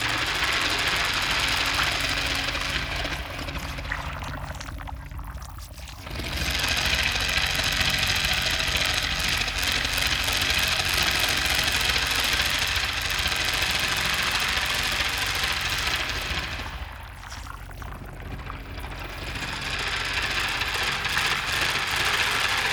cdf idle, anomalies; Arrival

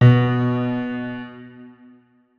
notes, keyboard piano; Hideout Furniture

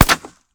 wood, bullet collide; JSRS Sound Mod

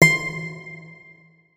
notes, guitar; Hideout Furniture

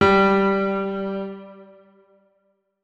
notes, keyboard piano; Hideout Furniture

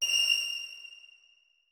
notes, keyboard strings; Hideout Furniture

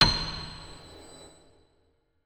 notes, keyboard piano; Hideout Furniture

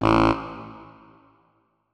notes, saxophone; Hideout Furniture

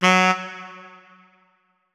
notes, saxophone; Hideout Furniture

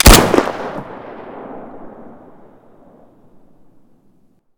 mp153 shoot; weapons; Boomsticks and Sharpsticks

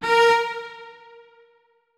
notes, keyboard; Hideout Furniture

